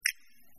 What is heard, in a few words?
button; Delicious Emilys True Love